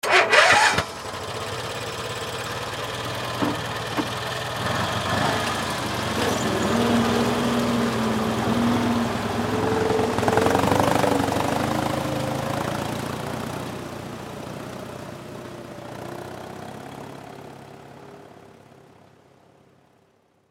Swamp Buggy Start Up Drive Away; Transportation Watercraft; Boat Sound Effects; Blastwave

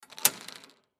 Snow Blower Handle, Snow Blower Handle Press Down; Snowblowers; Machines; Blastwave

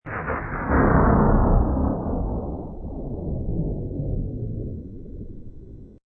Thunder, a major thunder clap during a storm; High quality stereo sound of a major thunder clap during a storm.; Hail; Weather

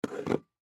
Packaging Tape; Industry; Blastwave

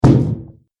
Body Slam Impact, wrestling and struggling

